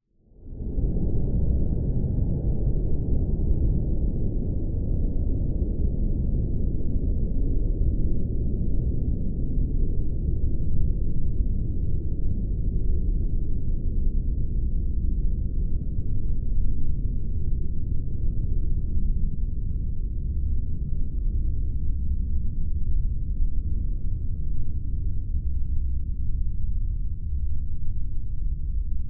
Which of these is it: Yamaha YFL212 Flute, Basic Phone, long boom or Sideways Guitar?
long boom